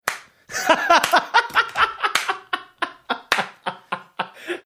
clapping laughing

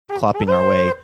making fanfare sound

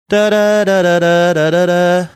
singing portion of

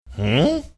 hmmh

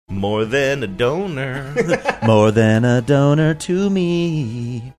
singing more than a donor

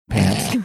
snorting

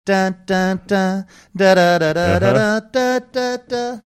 singing portion

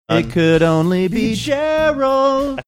singing it could only be

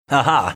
haha

